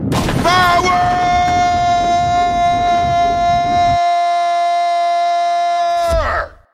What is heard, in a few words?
power